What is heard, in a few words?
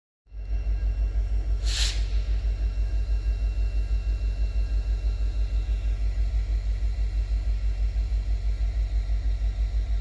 Sd70ace idle